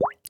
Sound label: Bubble